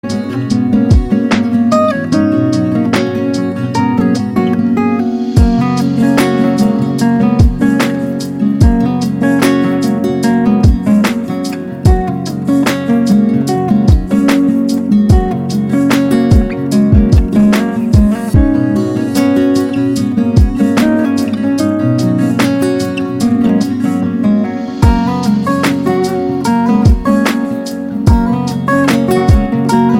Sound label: Lofi Remix; MIUI12